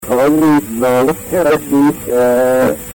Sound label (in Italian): cavalli; cavalli si dà là, c'era in pista!